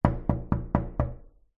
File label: household window person knocking on window